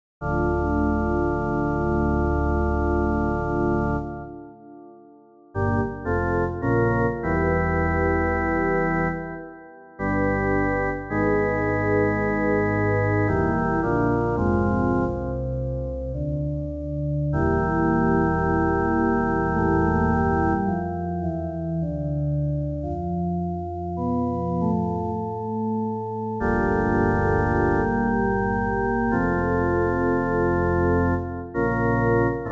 Ultra Analog VA3

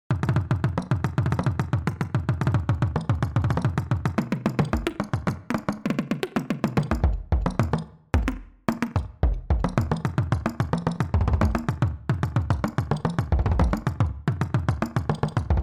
World Percussion

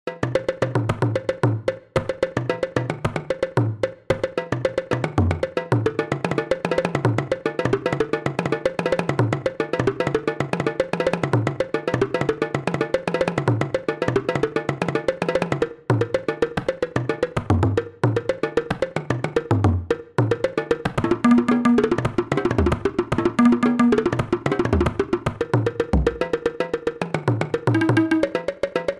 World Percussion

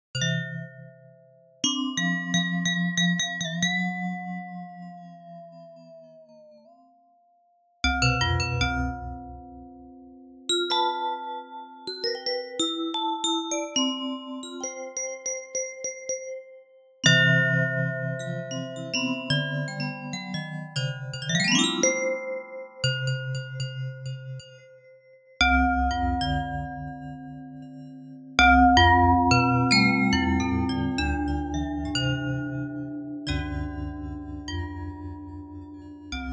Chromaphone

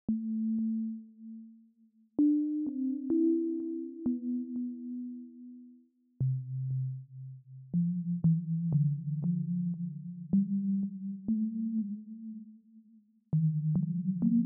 Padshop 2

